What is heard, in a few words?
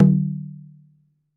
Tumba, Latin Percussion One Shots; Sonic Foundry (Sony Creative Software); Joe Vitale Latin Percussion